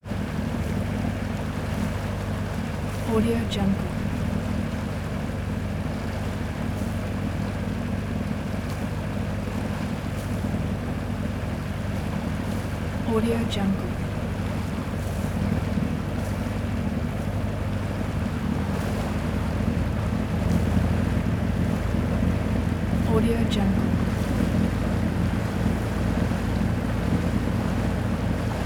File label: fishing boat